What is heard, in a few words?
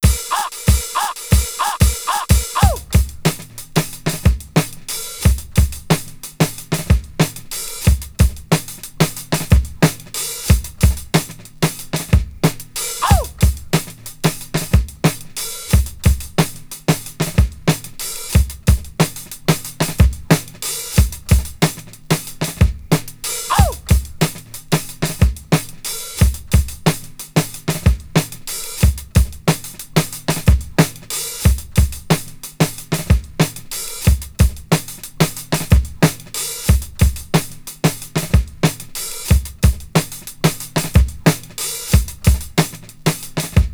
91.6 Loose Voicey, breaks; Lockdown Breaks